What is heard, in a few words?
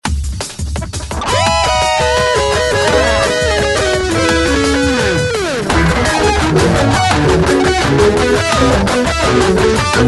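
drum n bass nu metal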